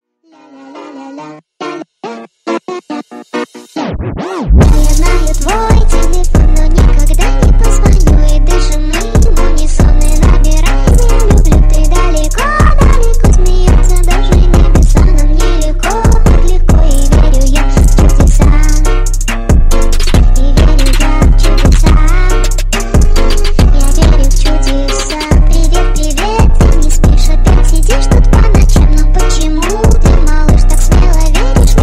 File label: jazz phonk remix